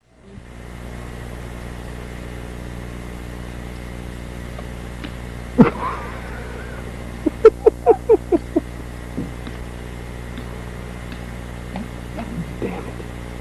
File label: splat